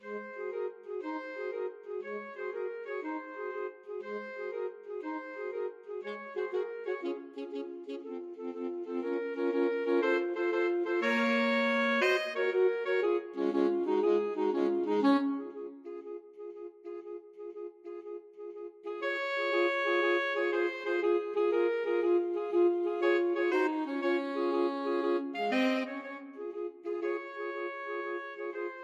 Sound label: alto sax trio